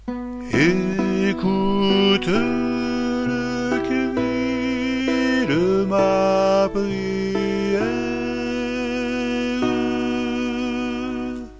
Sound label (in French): Ecoute Seigneur le cri